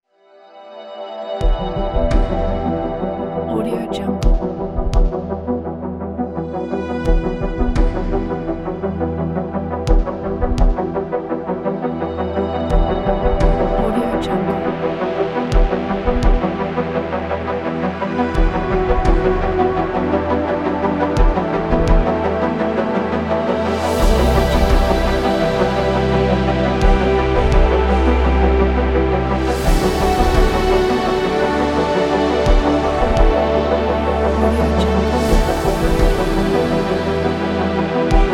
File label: dramatic synthwave